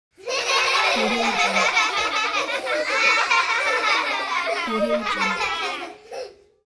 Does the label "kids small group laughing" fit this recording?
Yes